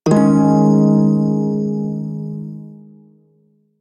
Shawzin Yo Chord; Prime Shawzin; Warframe

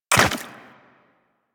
Footsteps Land Goo; Warframe; Tenno

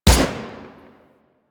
Emplacement Shot; Grineer

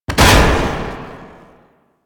Tank Swing Attack Impact; Enemies; Abilities; Warframe; Lasrian

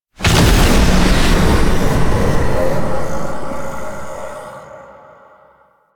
Full Health Stinger; Enemies; Warframe; Entrati Doppelganger

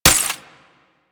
Sapient Pistol Mech; Warframe

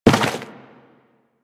Worker Step Default

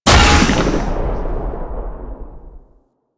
Secondary Shot, Weapons Cannon; Kuva Zarr

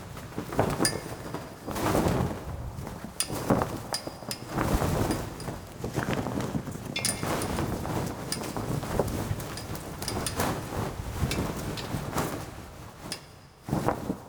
Tent Loop; Sinecure

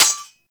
Melee Sword Impact Generic; Weapons; Impacts; Swords

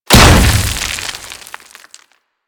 Hammer Impact Dirt A

